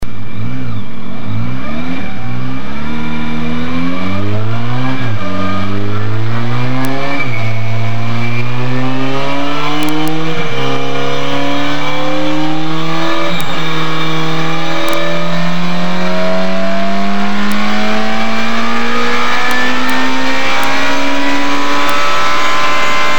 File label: Suzuki 1200 Bandit In-Line 4 cyl engine sound; 1999; Section Six: The Soul of a Bike – The Engine